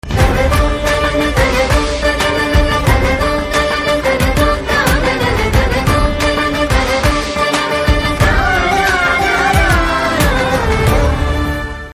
BGM Ringtone

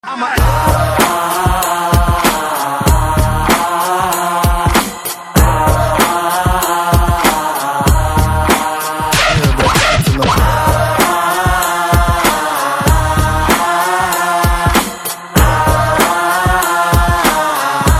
Anthem BGM Ringtone; Ghani

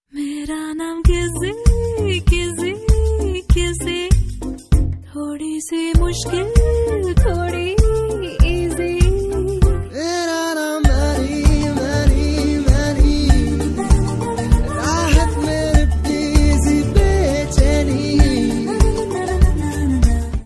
Ringtone

